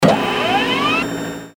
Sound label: printer on